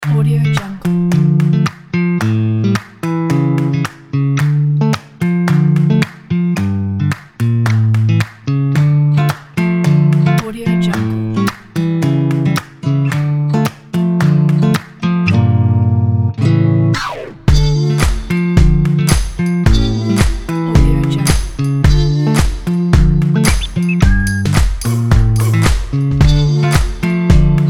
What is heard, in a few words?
inspirational latin hip hop style